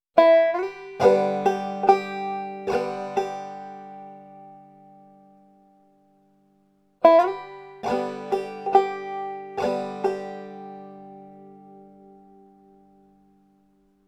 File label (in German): slide und quick slide mit bum ditty